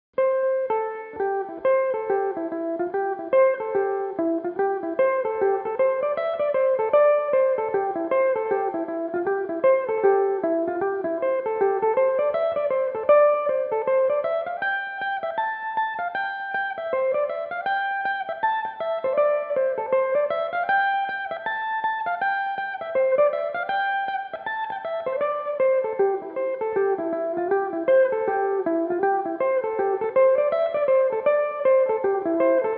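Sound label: D minor, irish mandolin, tunes; gan ainm 10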